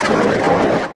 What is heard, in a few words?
cooldown; zrush